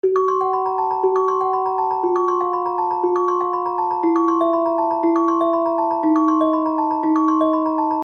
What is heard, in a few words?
Short Gothic